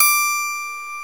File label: SWEDISH LY, Swedish Lyre; E-MU Producer Series Vol. 2 – More Studio Essentials; Composer